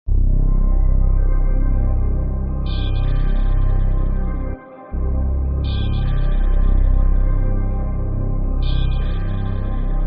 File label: Instrumental